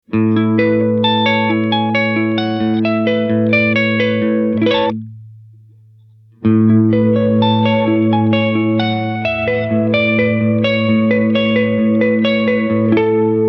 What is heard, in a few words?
Modulation Chorused Reverb High Picking